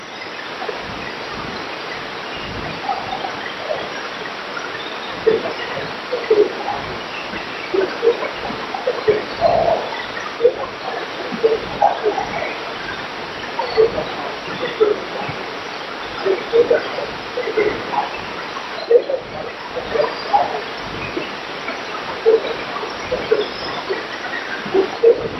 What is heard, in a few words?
FM -130dBm; SN-Vergleich